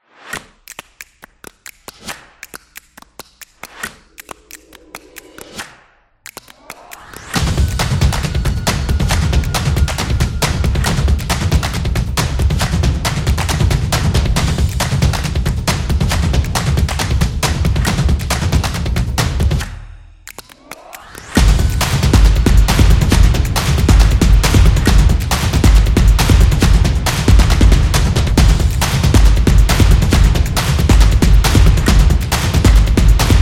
A Percussion Action; casual game

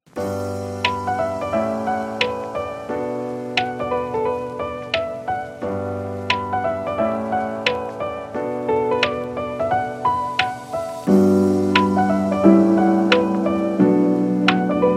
Lofi Upbeat

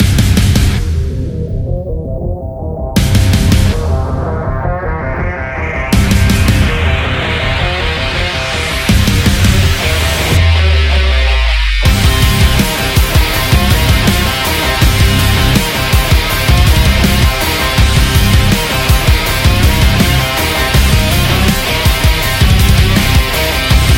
Driving Rock

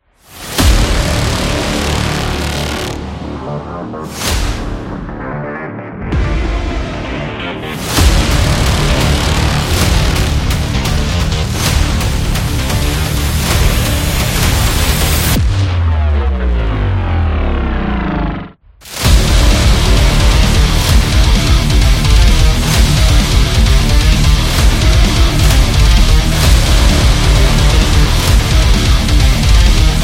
Cyberpunk

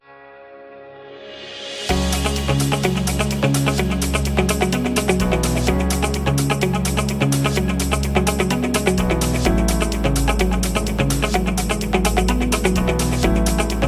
Corporate Background

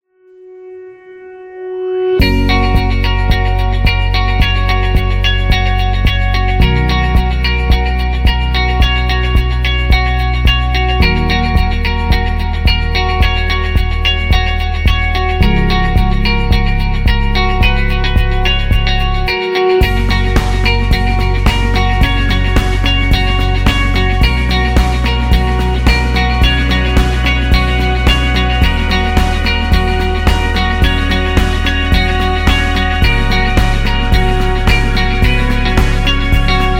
Corporate Perfomance, corporate music; corporate music vol 3

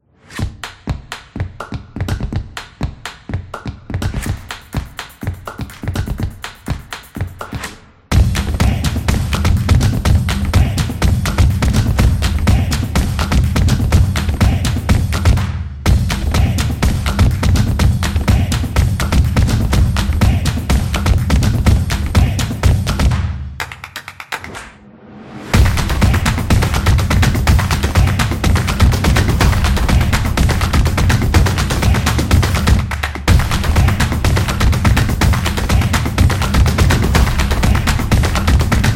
American Football, football music

